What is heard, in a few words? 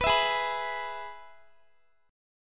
G sharp Minor Sharp 5th, Guitar; G#m#5 strummed; x,x,21,21,20,18